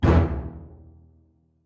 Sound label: orchestra hit melody; multisampled